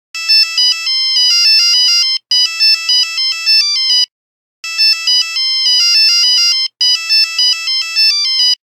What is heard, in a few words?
Songette, Nokia Buzzer; Windows Phone apps